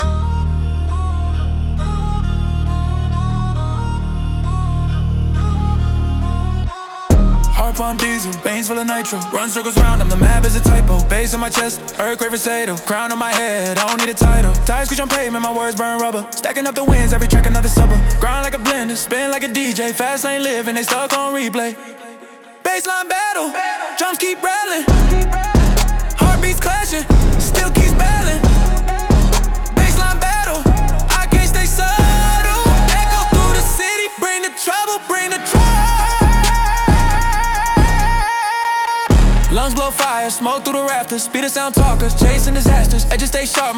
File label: Bassline Battle